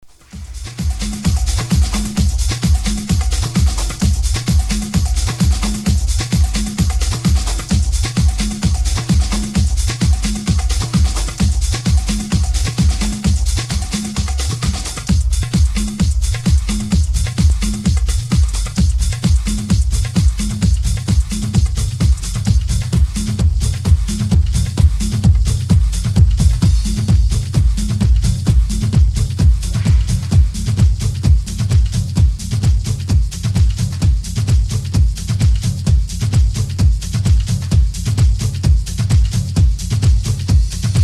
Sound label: Mixing Show House